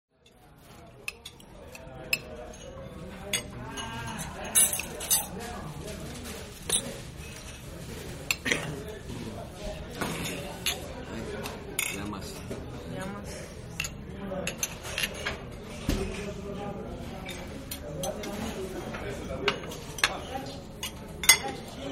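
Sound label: people eating; Anthropoi; Anthrwpoi Poy Trwne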